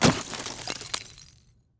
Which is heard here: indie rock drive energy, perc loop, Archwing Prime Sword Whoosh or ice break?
ice break